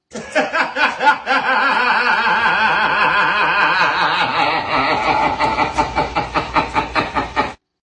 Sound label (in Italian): riso dramatico; youtube random